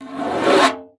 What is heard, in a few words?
Conga, Session Instruments